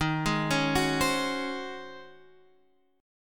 C sharp Major 7th Suspended 2nd Suspended 4th, D sharp